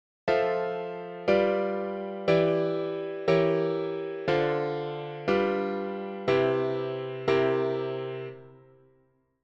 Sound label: piano